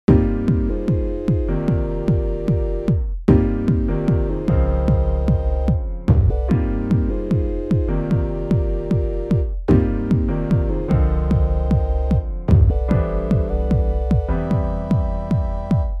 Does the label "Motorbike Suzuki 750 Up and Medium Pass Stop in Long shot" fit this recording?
No